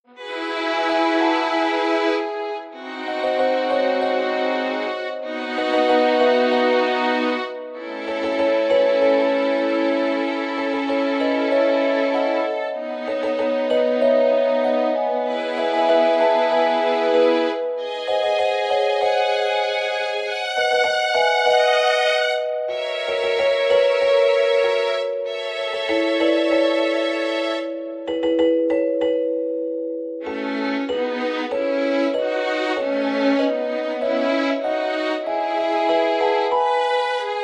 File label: Pnyx Violins